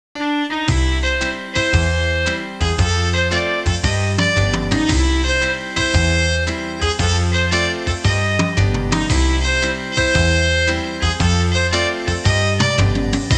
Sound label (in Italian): suonerie contemporanea; musica contemporary